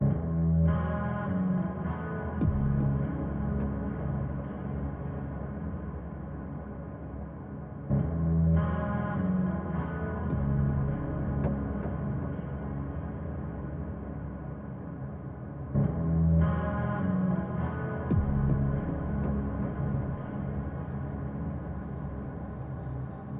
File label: thought, music; project-thought-experiment; theturtlebox